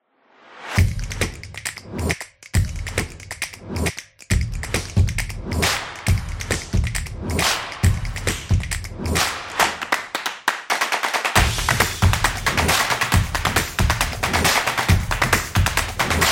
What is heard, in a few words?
Claps Percussion; percussive nation vol 4